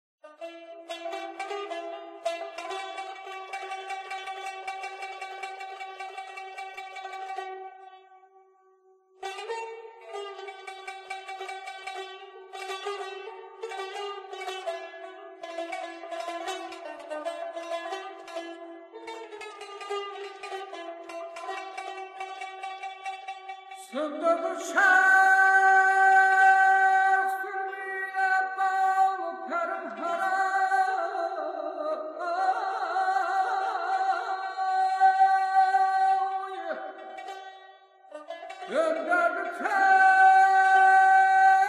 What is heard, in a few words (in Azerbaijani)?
bayati kurd; leyli